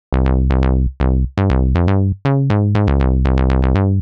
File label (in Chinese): Mercury的贝司声音; 使用滤波器的贝司声音，因为Mercury过分稳定，所以使得贝司不真实。